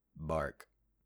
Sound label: Bark